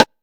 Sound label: TR707 Rim; Roland SH-32